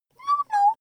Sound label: child play